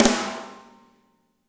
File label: MEDSNR, ROCKER KIT